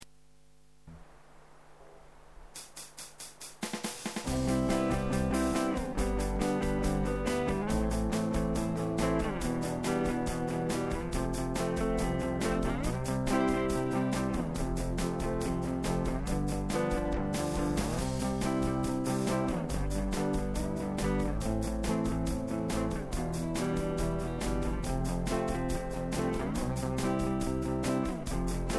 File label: bkgd music